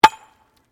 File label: Digging Pick Concrete Hard; Various digging tools and processes; Construction